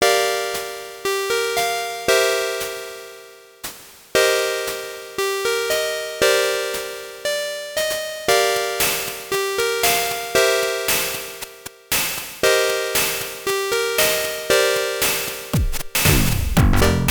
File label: new jack swing opl3; weeklybeats 2024